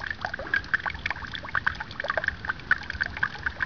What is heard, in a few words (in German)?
Dorfbrunnen; tauberzell; Ansbach